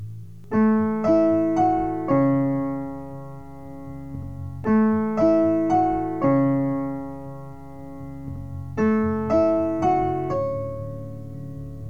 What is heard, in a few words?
questioning bells